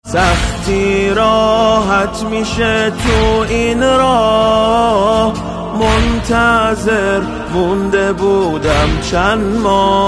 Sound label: ringtone